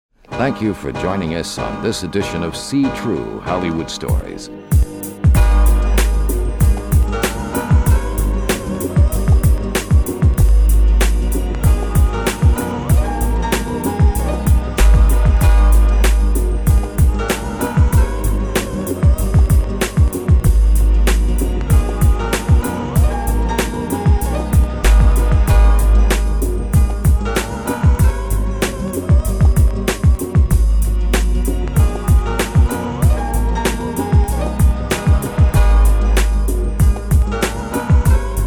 outro instrumental

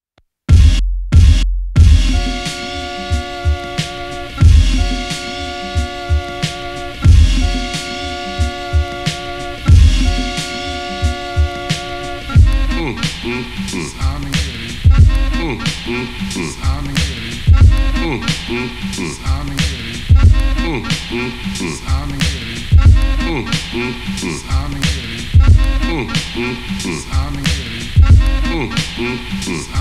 instrumental

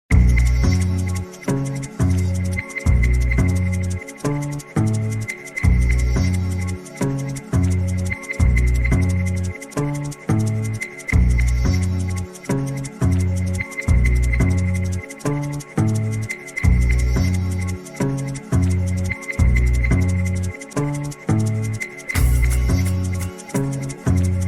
Instrumental

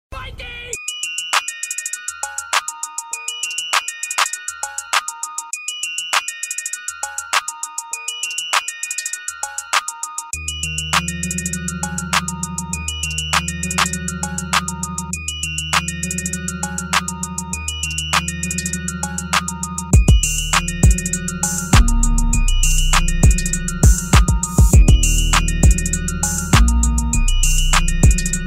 Instrumental

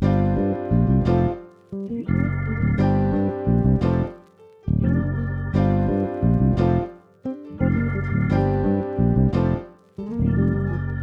bpm 87

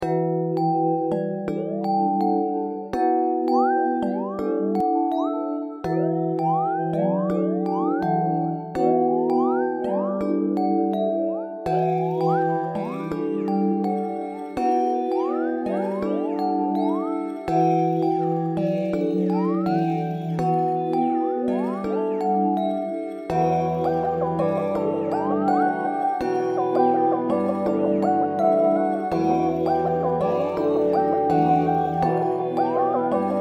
165BPM

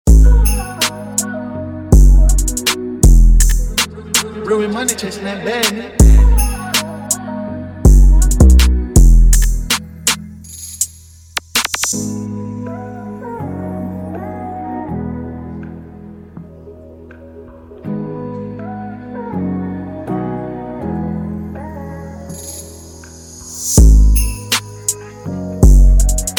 instrumental

